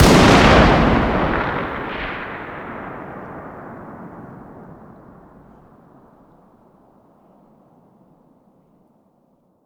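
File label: fire distant 338lm rifle exterior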